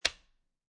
DVD Remove DVD From Plastic Case

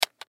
Video Game Joystick Button Push Switch; Video Games; Blastwave; Technology

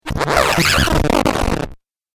Crazy Glitch 8Bit Style; Video Game Sound Effects

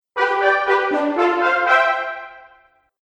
Fanfare; Musical

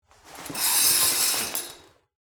Shower Curtain Open; Household, Shower, Curtain, Open, Locker, Room; Bathroom; BlastWave

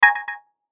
Hit Filter Delay; Sound Design; Blastwave

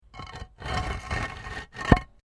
PAVING STONE MOVE, dragging; Material components for constructing more complex sounds; Dragging (Sound Effects:Objects)

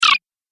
Arcade Game Very Fast Beeps; Video Game Sound Effects; Retro Inspired Sound Effects - Think Nintendo, Atari, C64, Etc.

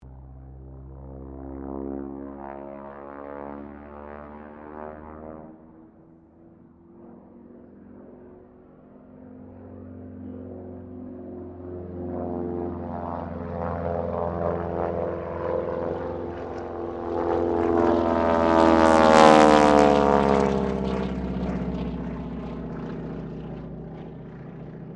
AIRCRAFT AT-6D HARVARD MK III ROLL STEREO; Temora Harvard MK III; Airplanes; Vehicles

